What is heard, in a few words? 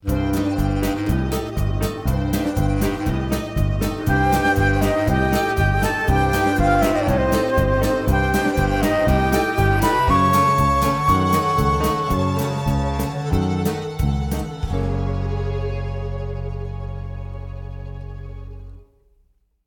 Snowy Streets Inst; willy wonka kids